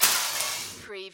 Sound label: Button Sound - Metal Wire Drop